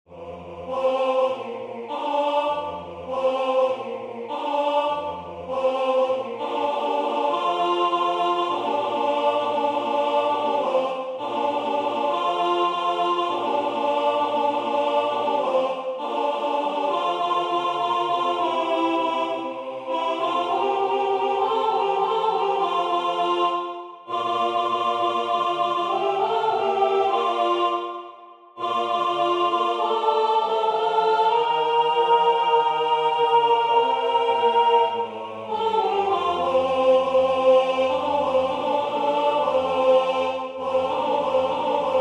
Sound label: Alto